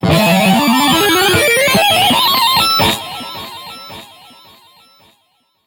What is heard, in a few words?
Blow up